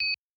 Video Stop; Lenovo; ui; P2

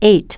digit 8, keypad tone; ZTE F451

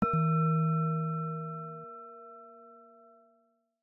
Singing Bowl, notifications; Samsung Galaxy S25 Ultra; Calm

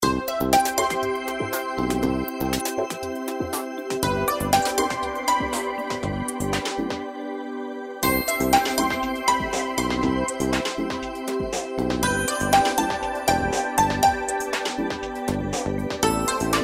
Classic, ringtones; Okapia; Spark

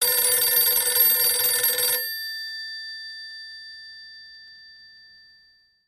Older Phone, ringtones; Tecno Phantom Pad II (G9)

